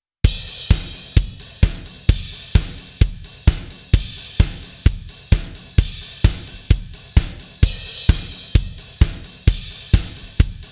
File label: Drum All